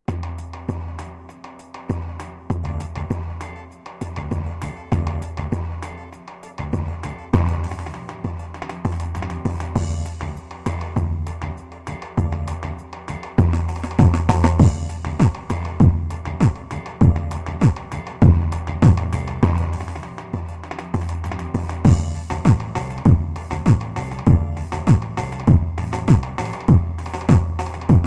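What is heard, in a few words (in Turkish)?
pa 500 tr halay, korg; KARIŞIK RİTİMLER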